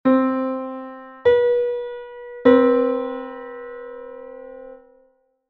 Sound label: major seventh (9-EDO)